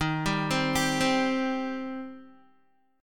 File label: Eb 6th Eb down Guitar; Eb6 arpeggiated; JGuitar